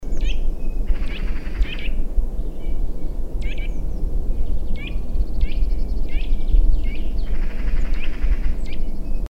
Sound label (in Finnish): naaras call